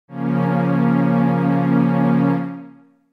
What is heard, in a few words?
Db Bb Minor